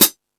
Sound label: High Hat, Drum Machines